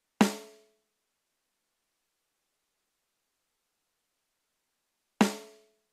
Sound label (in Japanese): スネア 5000ms; ディレイ